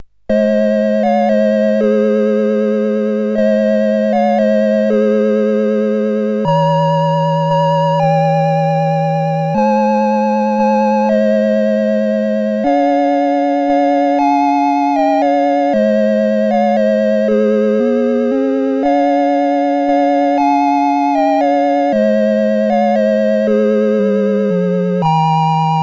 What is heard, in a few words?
Silent Night, CSG8580R5